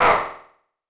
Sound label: bump; Alien Arena; firebrand